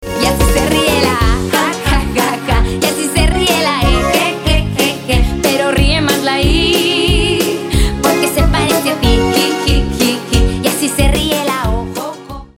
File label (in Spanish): risa vocales